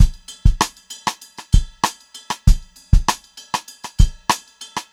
FUNKY, Funk-Groove Drumloops; USB Soundscan vol.38; AKAI; 90 s Sample CDs

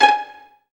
STR PIZZ, STR Pizzicato

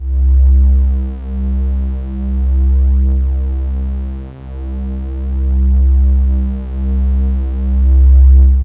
Synth, Raw Analog Multi's; Dream Zone

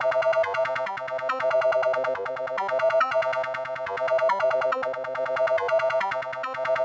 140 C synth, Synth Loops, Trance; Trance Explosion Vol1

